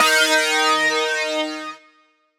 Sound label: Riddim Melodic Hit D# Eastern; One-Shots; Ghosthack; Riddim Essentials Volume 2